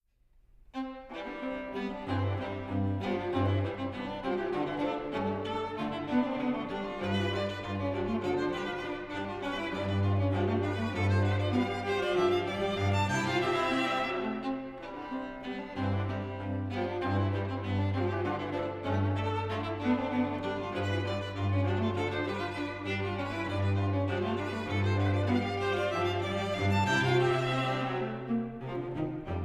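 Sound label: String Trio; Wheelock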